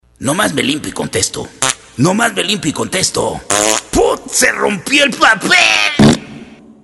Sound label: Fart phone